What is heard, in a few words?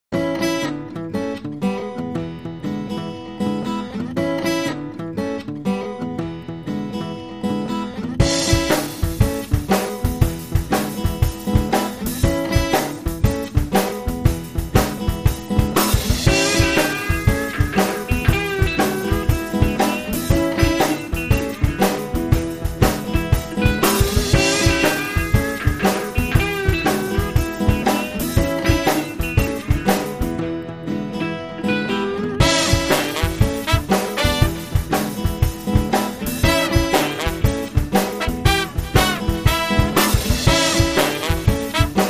Kitchen Groove